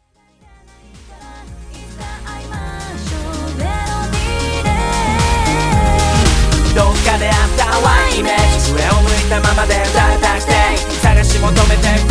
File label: melody rain